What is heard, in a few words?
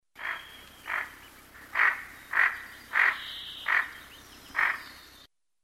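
red-breasted merganser voice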